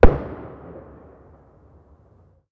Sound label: Explode Distant